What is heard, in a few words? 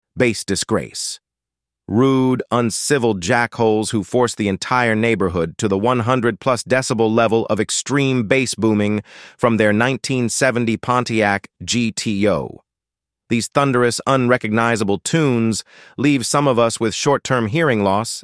Bass